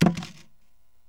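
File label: BOX RATTLE, Box Noises; E-MU Producer Series Vol. 3 – Hollywood Sound Effects; Ambient Sounds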